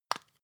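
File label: floss open, Bathroom; haus-garten; badezimmer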